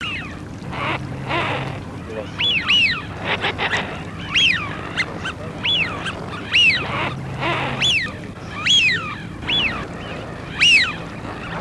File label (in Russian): Свиязь Fischione; plurifon